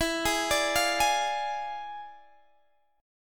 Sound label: E 7th Flat 9th E down Guitar DADGAD